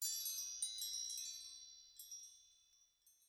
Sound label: shimmer, amethyst; minecraft; block